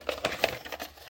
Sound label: VHS Handling; SoundsCrate